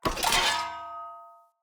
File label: Great Sword Equip; Weapons; Swords; Dendrit Ara Great Sword